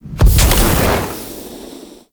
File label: Arachnoid Camper Heat Beam Fire; Warframe; Corpus; Abilities